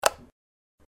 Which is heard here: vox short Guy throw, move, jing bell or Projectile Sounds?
move